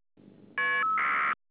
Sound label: FSK